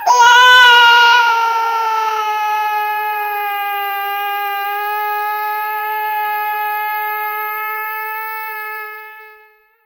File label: scared monkey, trembling voice